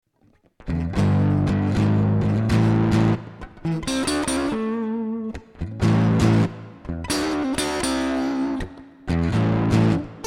switch between dist1 and dist2 and different levels of Distortion